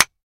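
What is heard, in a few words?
Mouse Trap Single Snap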